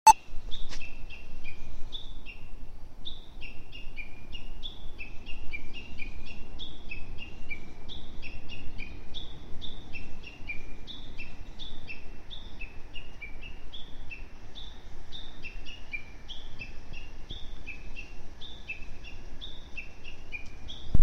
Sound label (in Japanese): uguisu tani watari naki